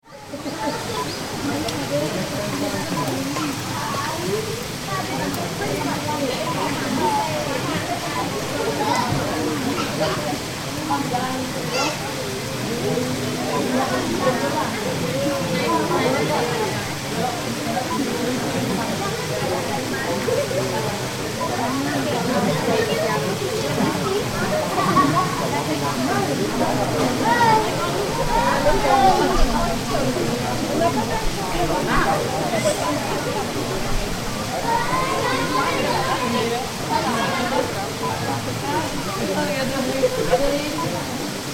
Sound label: Hot Springs Public Baths